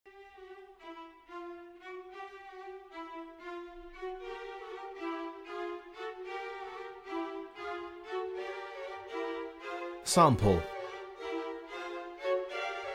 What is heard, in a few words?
classical